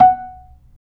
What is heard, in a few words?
B-3, Percussion; Roland; Keyboards of The 60's and 70's